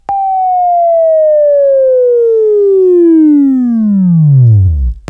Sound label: WAV Left, Sweeping; Sweeping Training program